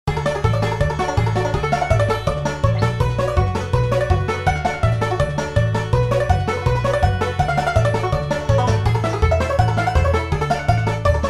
front porch washtub bass

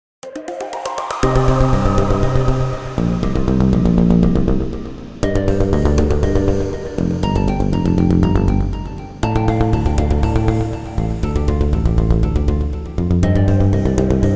my tube kraft tube

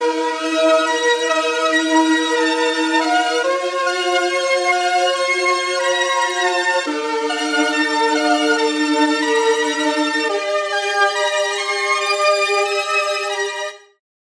lead wobbly pressure

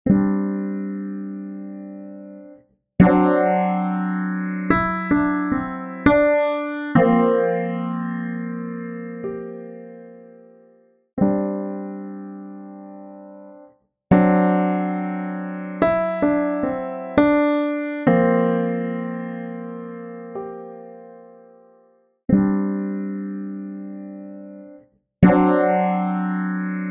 instant phaser mk ii

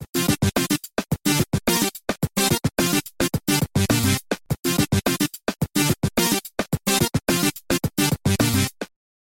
moog system 55 bass; bassesland

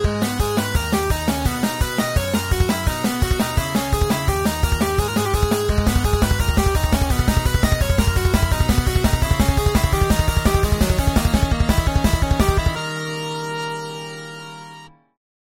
hard lead

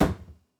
cabinet door closed

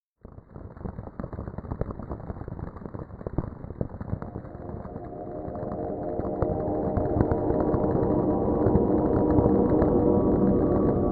soundscape

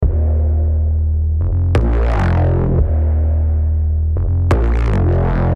loop bass; memories

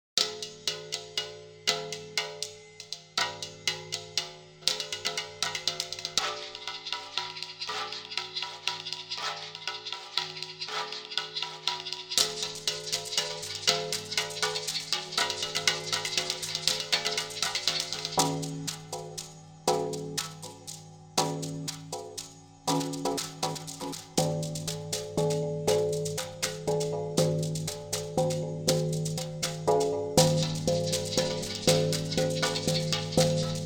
3 against 2 loops slate

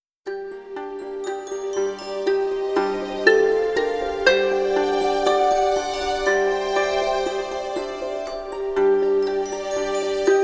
strumstick

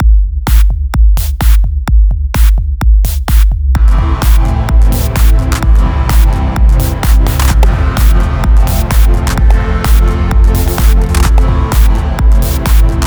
128bpm customizable

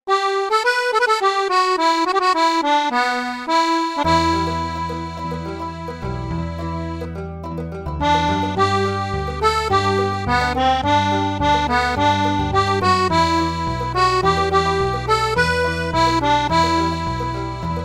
anglo concertina

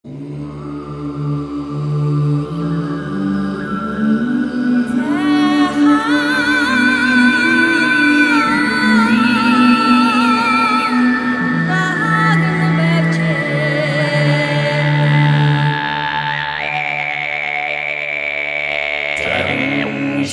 voices choirs mongolian

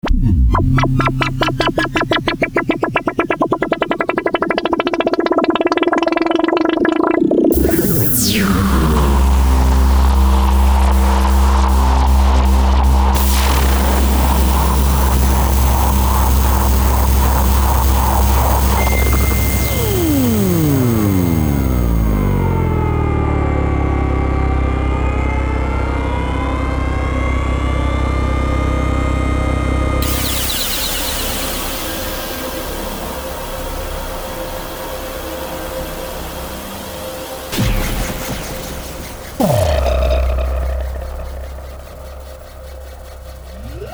burst sfx

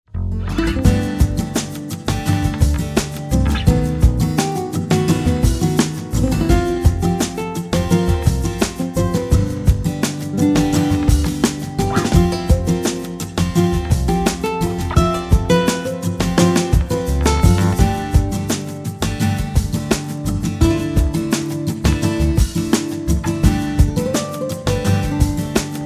poetic guitar

